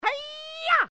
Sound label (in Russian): klich